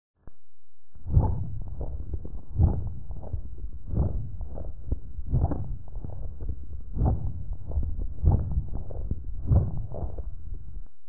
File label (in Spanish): Estertor